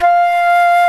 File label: SOLO WINDS